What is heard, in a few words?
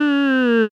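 voice sigh, female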